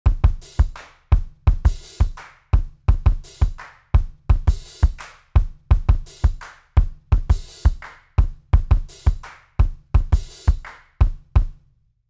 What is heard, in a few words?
drum pattern